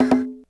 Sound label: P, PERCUSSION